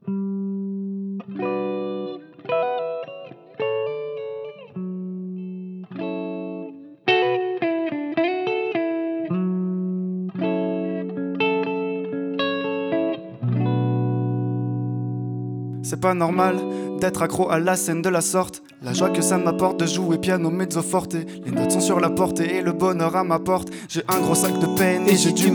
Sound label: Dub live